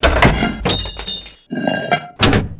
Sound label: t34 reload; Spearhead Demo; MacFormat 2003 June